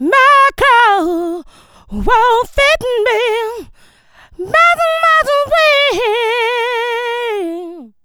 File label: VOX, funky vocals; AKAI; USB Soundscan vol.18